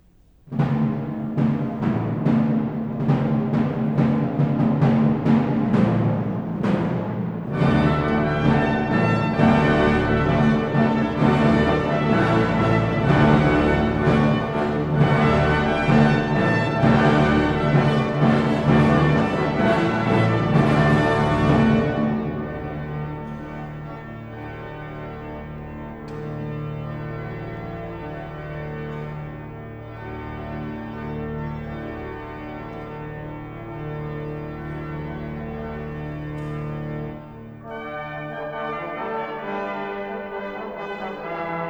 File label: Fanfare; X de Lalande